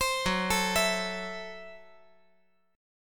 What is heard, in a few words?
F sharp Minor 7th Flat 5th F sharp; arpeggiated; JGuitar